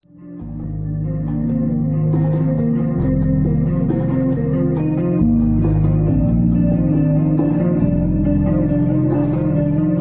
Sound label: bells